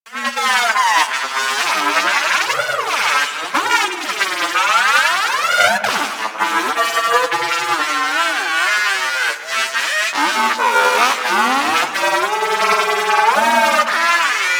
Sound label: Gas City Robot Cleaner Loop; Ambience; Props; Warframe; Corpus Gas City; Props Remaster